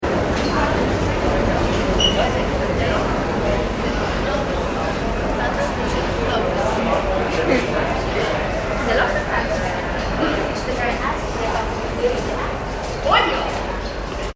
ambience train station subway people busy; Subway Train Station ( New York City ) Ambience - No Trains - Lots Of People Walk And Talk; Subways; Vehicles; McKinney Sound

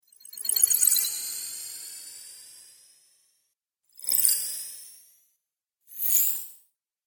Quick Spells, Magic Sound Effects; 3 Quick Spells - 02; Role Playing Game

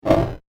Old Skool Zx Fire Burst Whoosh; Andrew Fleming

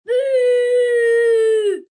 "Boooo", Boo, 1 child; Booing

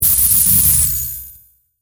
Electric Discharge, Magnetic, Science Fiction; Sci Fi Sound Effects; Blast Wave

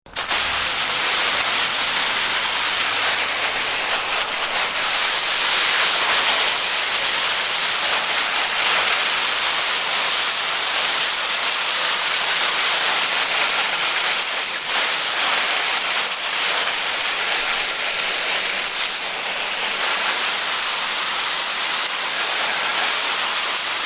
Water Sprinkler, Sprinkler on a hose.; Spraying; Objects

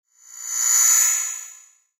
Sci Fi Scanner Beam Short; Tech

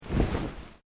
Clothing Kimono Sleeve Move

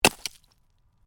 Ice: medium chunk of ice lands on hard surface; Snow

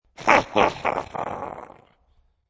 Evil Chuckle; Evil Laughs (Sound Effects:Horror)

